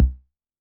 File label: 808 BD short, Roland-808 Drum Hits Bass Drum